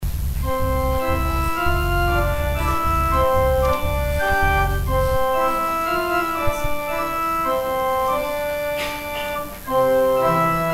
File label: organ interlude